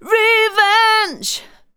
vox, Techno Trance; Ueberschall

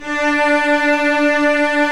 Vcs2 f vb; STR Vcs 1-2 vb

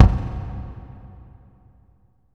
BOOM, KICK DRUM; Sampleheads; New York City Drumworks VOL-1

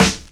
SD, Dance Drums

